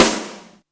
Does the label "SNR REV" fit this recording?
Yes